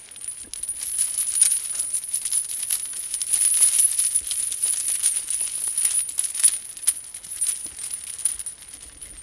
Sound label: Loop Chain; KriptoFX; Realistic Effects Pack v1; PARTICLES